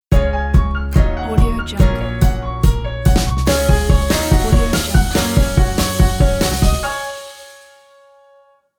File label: logo intro